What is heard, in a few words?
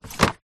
household plastic utility unit drawer slide close